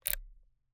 Camera, Kinusa K77, 50mm lens, plastic, serrated, lens adjust, quick; Eiravaein Works; Vaeyan IV